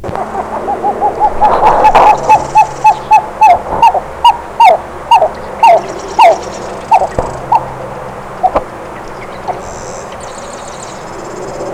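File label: owhoop, Pied-billed Grebe; National Marsh Bird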